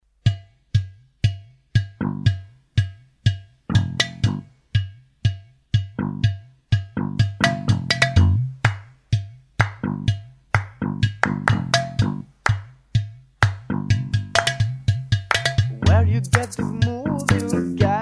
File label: funk n twist